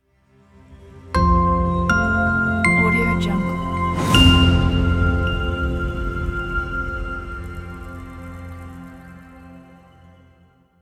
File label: tech logo